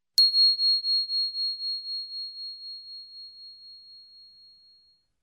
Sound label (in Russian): dzyn kolokolchika